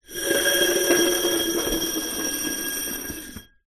household drinking glass scrape on metal draning board; zapsplat